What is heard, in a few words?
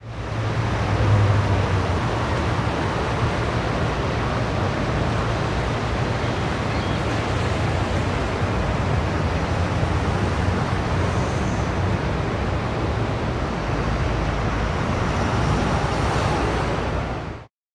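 Tokyo Ambience low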